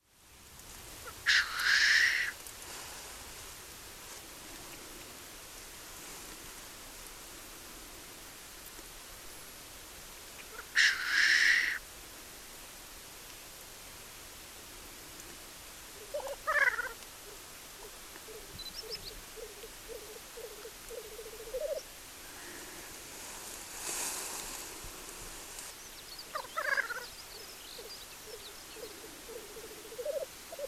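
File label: teterev golos, black grouse voice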